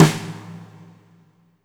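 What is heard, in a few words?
fat snare right; Spectrasonics; Burning Grooves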